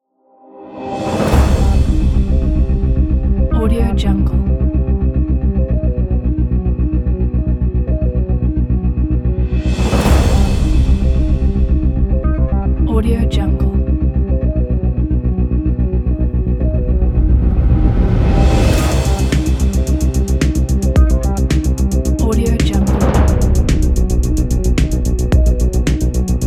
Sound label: sci-fi theme